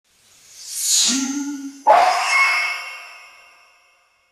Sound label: wheel of fortune sound, carnival